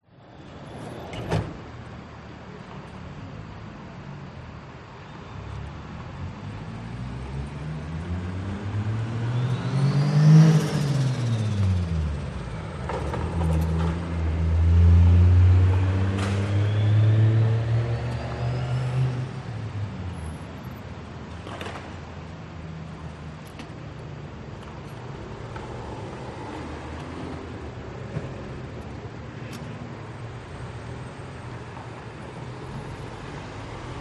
airport outside pick up area ambience car doors cars pass birds early morning; hamad int qatar